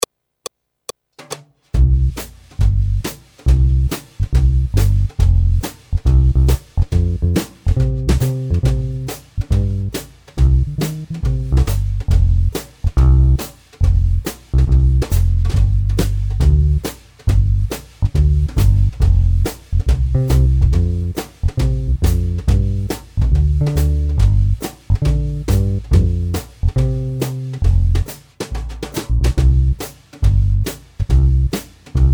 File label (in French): Accompagnement seul